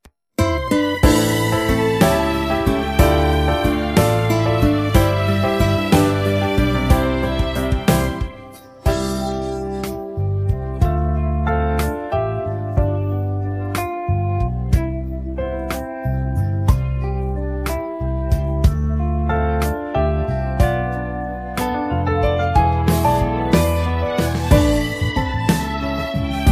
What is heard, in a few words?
instrumental